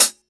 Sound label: sharp hi hat sample C# key